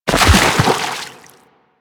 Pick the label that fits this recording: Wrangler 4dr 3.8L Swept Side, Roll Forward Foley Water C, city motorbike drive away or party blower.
Roll Forward Foley Water C